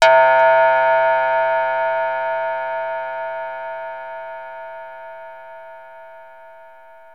Access Virus B; Synthworx

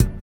VIBRA; Spectrasonics; Metamorphosis

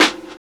DR. LOOP, DRUMS; X-Static Goldmine 3

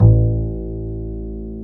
STR CB, Chamber Pizz; String Master Series

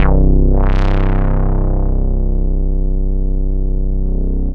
AZURE C2, BASS; Q Up Arts; Dream Experience

